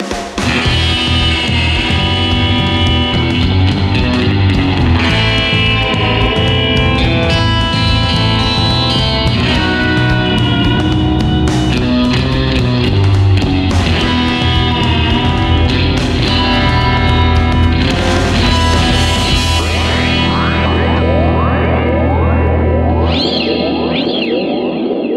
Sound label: Tape Echo Surf GTR Track